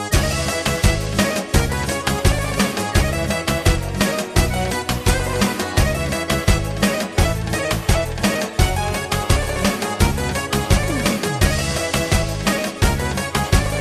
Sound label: Balkan Turbo Folk